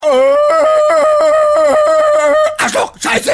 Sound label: Shouting Out